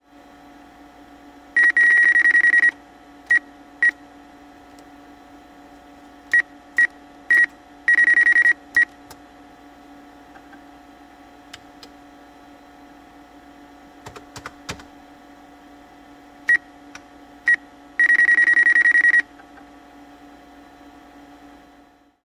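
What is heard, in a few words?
computer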